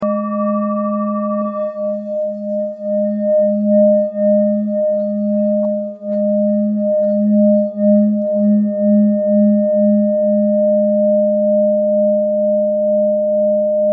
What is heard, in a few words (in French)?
Bol grave re diese